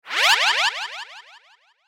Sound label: Sci Fi Ray Gun, Sci Fi Ray Gun Alien Beam Short Sci-Fi; Cartoon; Cartoon Sound Design